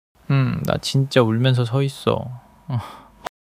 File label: crying